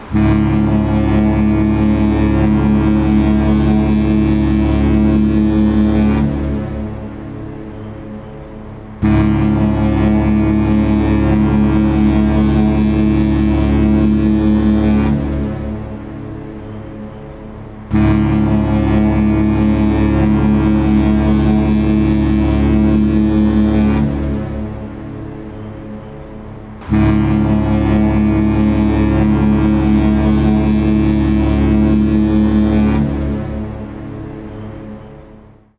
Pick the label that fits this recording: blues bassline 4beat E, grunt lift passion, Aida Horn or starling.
Aida Horn